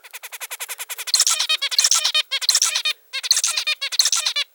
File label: zebra finch